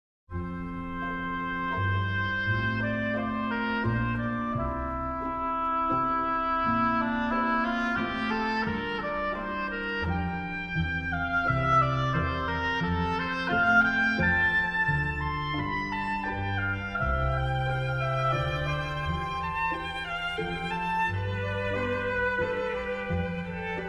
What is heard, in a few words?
Concerto For Oboe And Violin In C Minor; BWV 1060